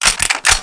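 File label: pickup, weapons; SINDEMO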